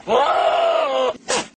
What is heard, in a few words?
angry goat